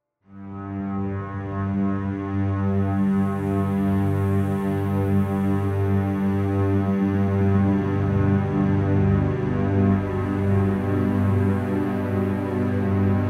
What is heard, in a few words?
KORG wavestate Stereo Out; No Fahrenheit